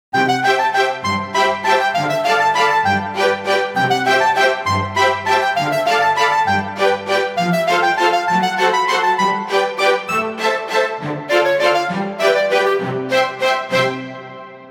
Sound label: Fun waltz, Jingle